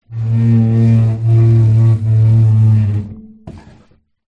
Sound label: furniture chair dining metal framed slide wood floor long rub